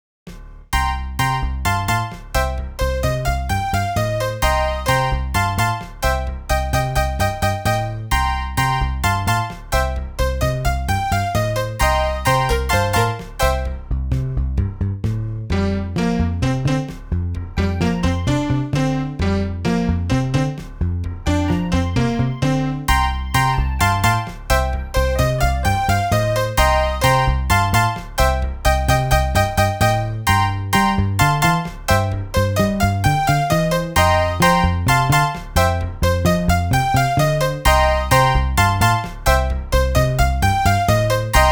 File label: Roland SC-88; SimCity 2000